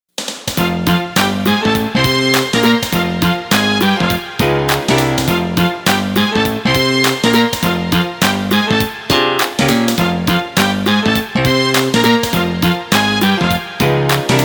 accomp track, Happy Birthday

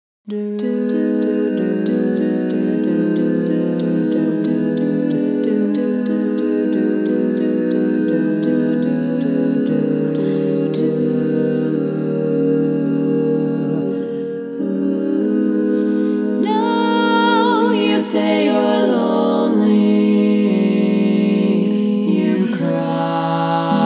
lead solo

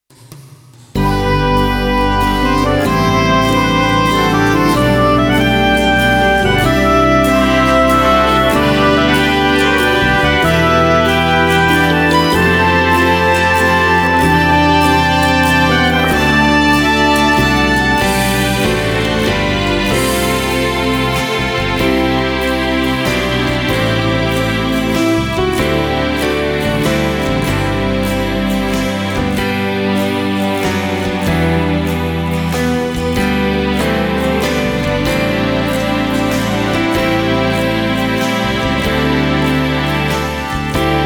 SATB Instr

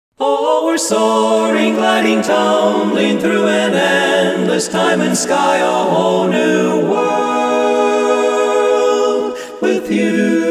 male soloist

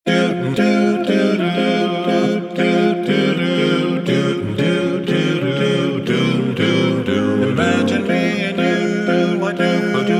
acappella

